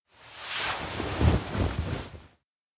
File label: old floor lie; anemoi